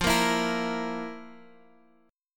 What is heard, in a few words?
F Major 7th Suspended 4th, Guitar, Open G; down; chord shape